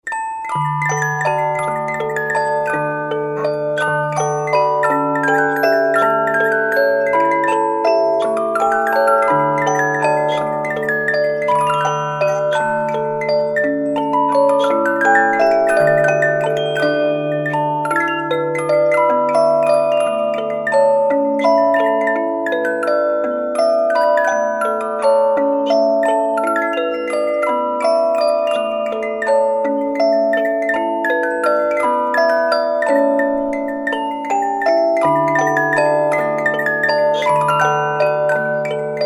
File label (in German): Kleine Walzenspieldose